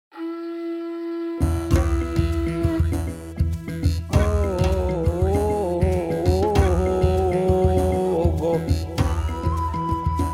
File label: Ringtone Mix